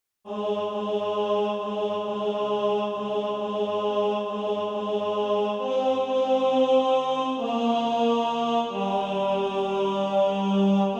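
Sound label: tenor, SATB Practice Music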